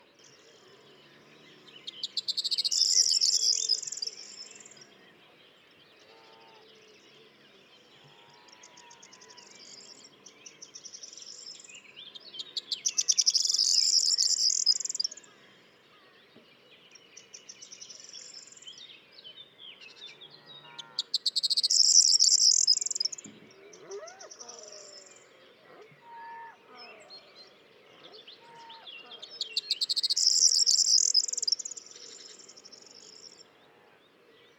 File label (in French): Bruant proyer Emberiza calandra